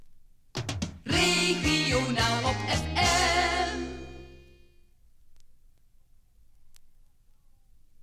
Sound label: topformat maxi jingle single